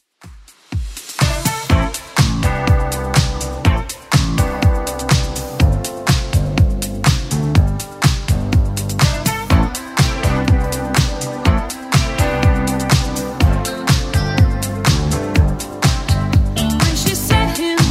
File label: 80s Club Edit 123bpm